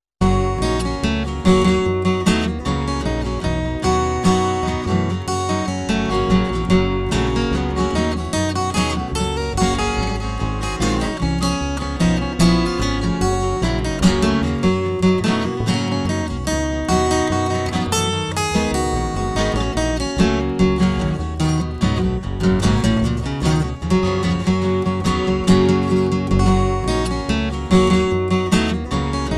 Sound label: Loop